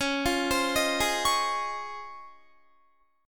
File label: Db Major 9th, Guitar; arpeggiated; JGuitar